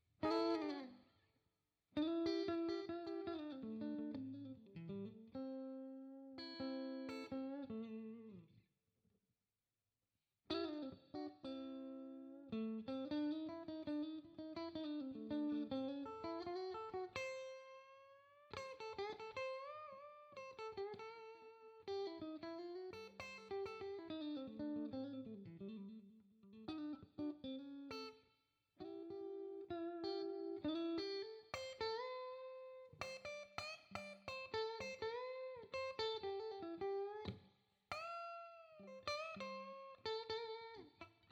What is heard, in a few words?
Triplet Feel Solo Example, blues guitar